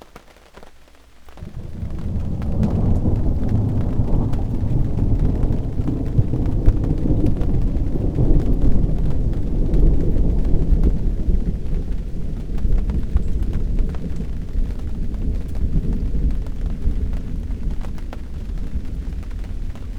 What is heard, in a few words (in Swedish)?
dunder